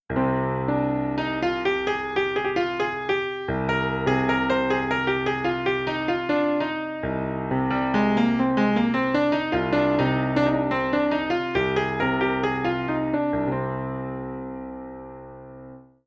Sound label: Short Improvisation in C Aeolian